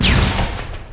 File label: die